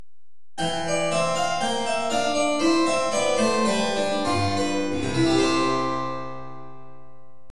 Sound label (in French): accord baroque